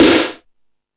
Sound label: Hip Hop, Drum Machines E-MU Pro-Cussion